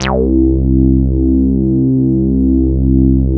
MOOG TAURUS C2; Big Fish Audio - First Call Vintage Keyboards

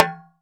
Oct Tom, Roland SPD-S

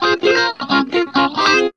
GUITARS 135, House

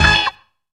TRILLER STAB; Zero-G; Funky Elements

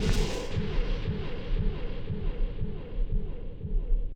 FEAR FX; X-Static Goldmine 2

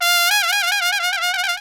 THE TRUMPET

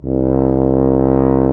TUBA

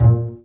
BA PIZZ, Orchestra

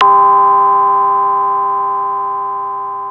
Anasound Long mid C, Analog Single Notes; Sonic Foundry (Sony Creative Software)

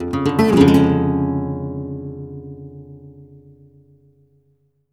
Guitar, Flamenco, c#; Best Service ProSamples vol.46 - Flamenco; Frase

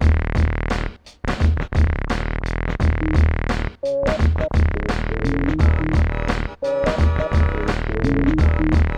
86BPM, Hip Hop; InVision Interactive; 40 Oz Phat Slammin

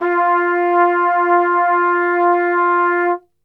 F 2, Brass; Best Service; Brass Super Section

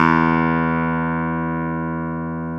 KEY X Hohner Clavinet; Club-50 - Foundations Roland; Clavinets

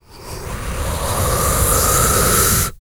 VOX FX, BREATH FX

